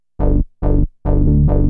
bass C; Techno; TechnoMusicMaker

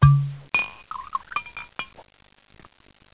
glub

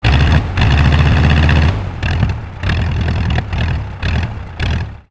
stall loop; planecr

